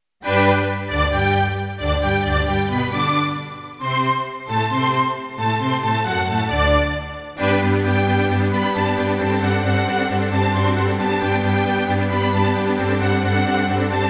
Miditemp DoX-1; classical; Mozart; Eine Kleine Nachtmusik partial

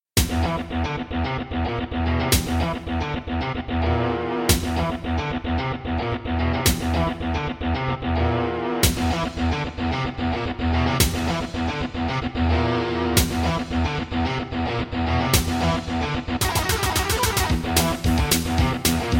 Roland Edirol SD-20 GM Contemporary; Doom - E1M1

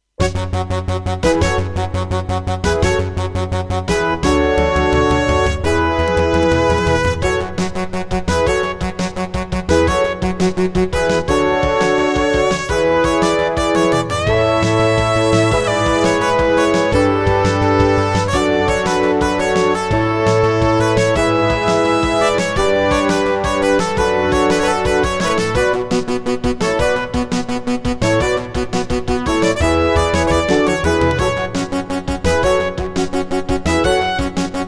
Intro, MP32L MT32 ROM; Serdaco; 4D Sports Boxing

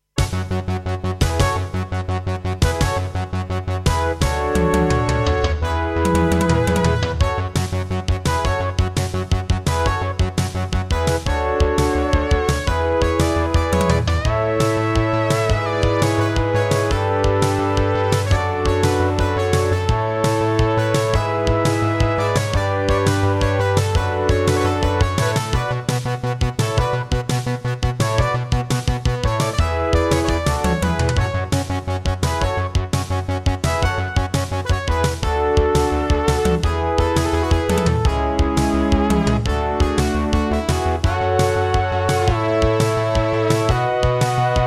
Intro, Roland SC-88 Pro MT32 Mode; 4D Sports Boxing

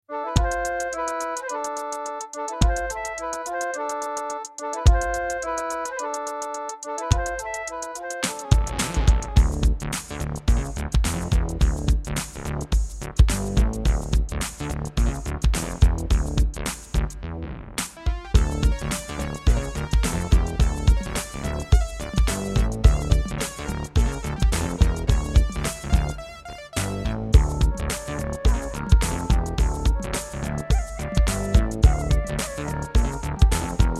Hammond GM-1000; Lost Vikings

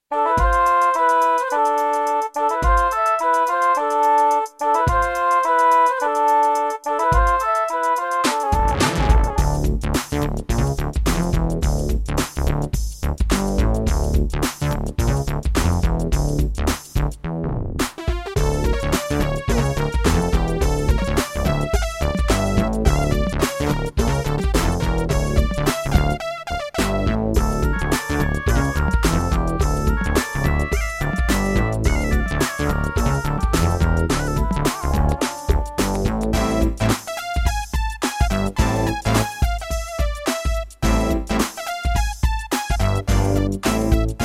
Roland XV-2020; Lost Vikings

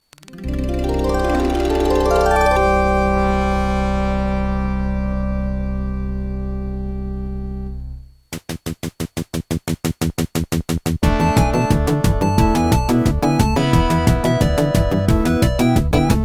Creative SB16; Willy Beamish

